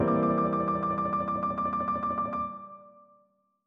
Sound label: Rock Piano